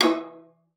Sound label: E3, VIOLA FINGER; Xsample VOL-5